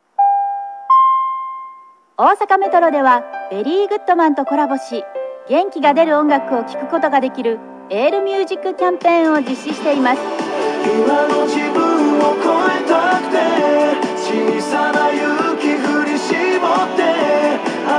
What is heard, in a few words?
yell music; Osaka Metro YELL MUSICキャンペーン